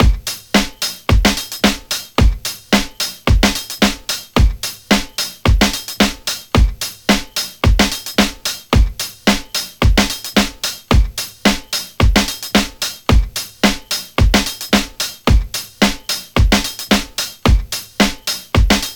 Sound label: 110 Smash Hits, Breaks; Lockdown Breaks